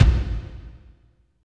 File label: KICK, Tekno Industrial; East West